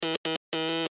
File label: Morse Code U Letter; Blastwave